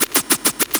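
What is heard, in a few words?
scratch, record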